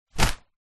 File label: household umbrella close grab hand